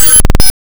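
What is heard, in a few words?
waveform FM sawtooth triangle silence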